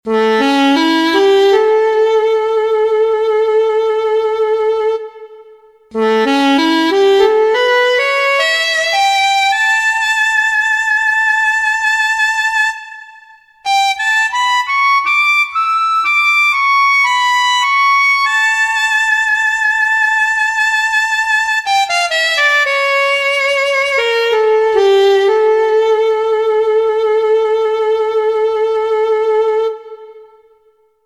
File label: Sax